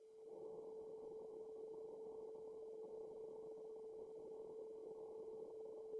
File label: sine 440Hz